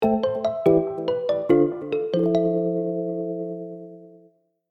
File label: jingle